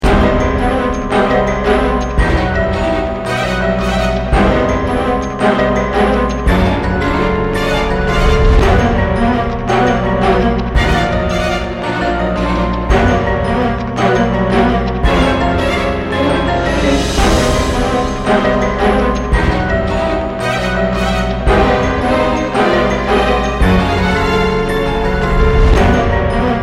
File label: bomb planted